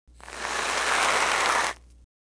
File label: whipping cream